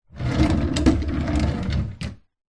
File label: office drawer wooden open or close